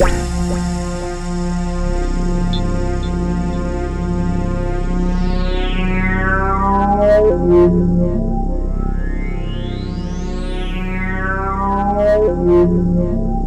C3, SynthLine

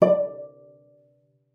cello pizz D5; 90 s Sample CDs; Xsample VOL-5

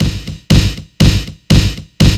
BEAT KICK

